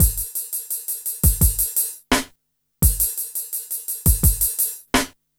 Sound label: Smooth, R&B Drums